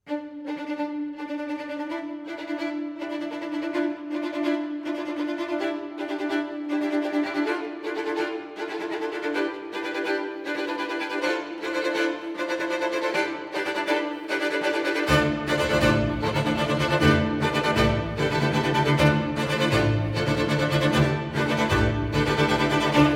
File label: violin concerto excerpt